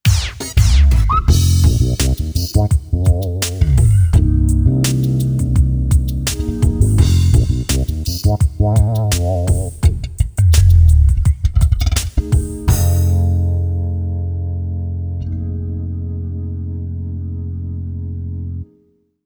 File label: bass and drums; xp-80; roland; Multi-patch demo; roland demo cd-3